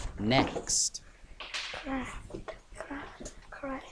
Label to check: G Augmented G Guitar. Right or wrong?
Wrong